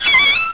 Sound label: rusty